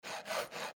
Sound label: metal sawing